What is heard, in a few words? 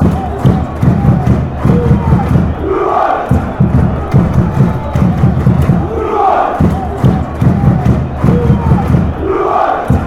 drums gent